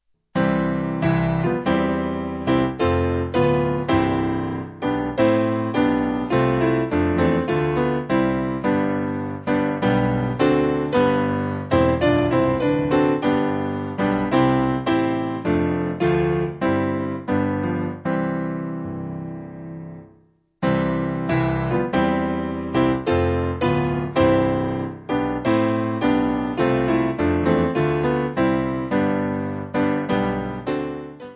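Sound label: Piano, church music backing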